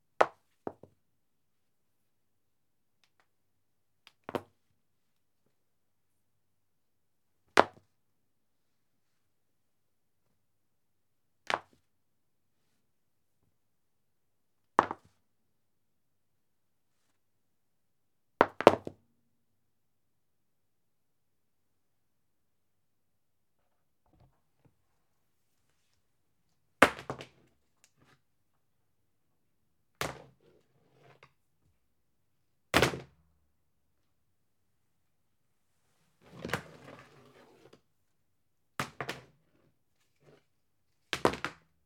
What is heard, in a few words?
Cable Fall